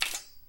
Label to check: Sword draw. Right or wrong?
Right